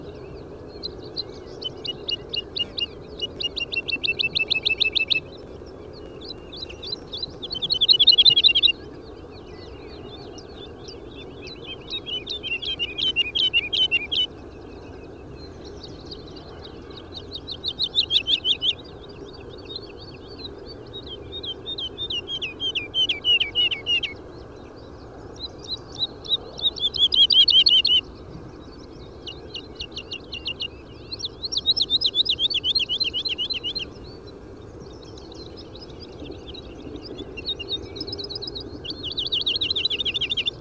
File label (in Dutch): Boomleeuwerik Lullula arborea arborea